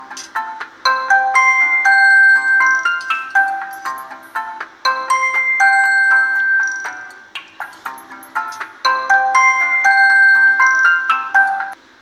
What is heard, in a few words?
Vivo Original Ringtone